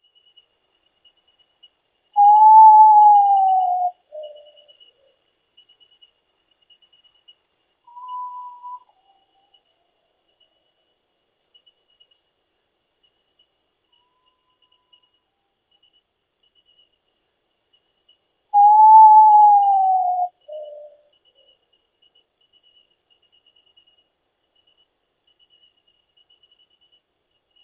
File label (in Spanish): URUTAU COMUN